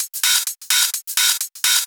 Hihat Loop 128 BPM

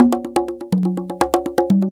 Conga, Percussion Loops

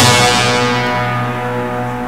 EMULATOR; Rubber Chicken Software Sample Bank

